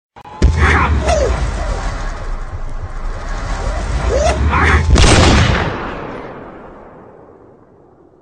zombies kaboom

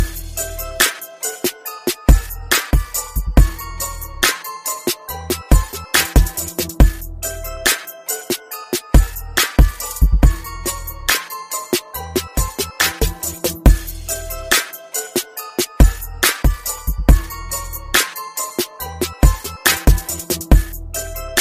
Santa Hip Hop

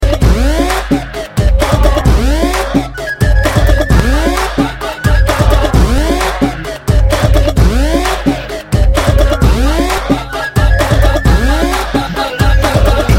Electro Tone

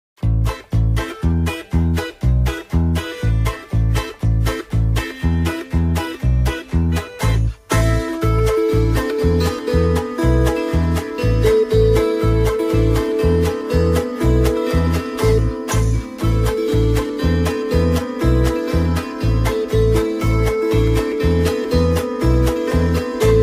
Sakkijarven Polkka

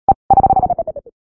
Dying Tone SMS

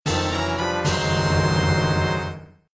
get item

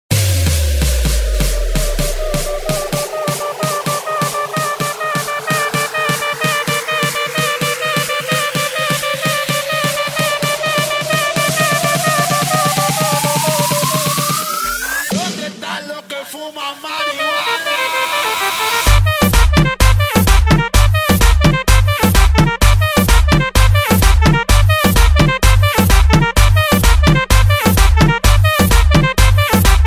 Guaracha

